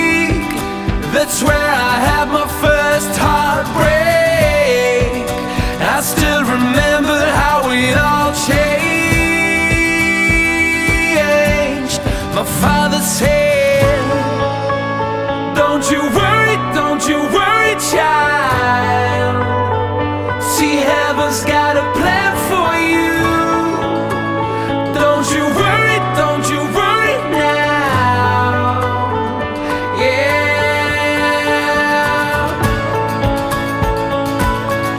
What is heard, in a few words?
Acoustic Version